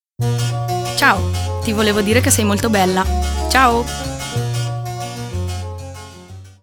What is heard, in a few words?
Jingle; Sei molto bella